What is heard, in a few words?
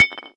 grenade bounce